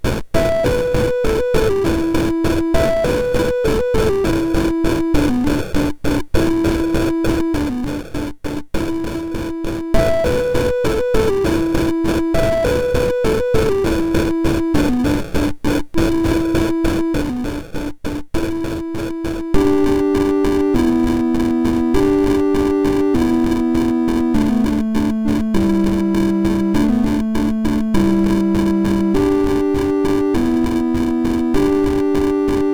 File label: Indian Drum, MOS6581R3